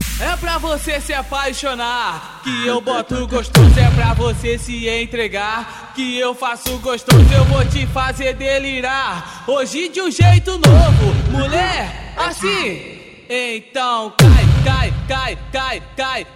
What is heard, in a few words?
funk bass